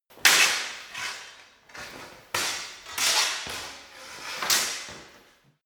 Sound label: sword hit sword sequence